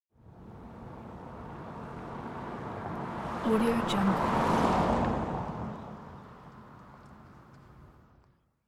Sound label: a car drive by